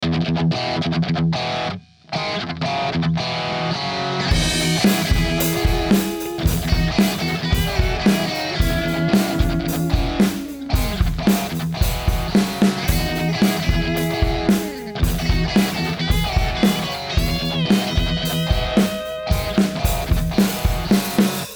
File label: Guitar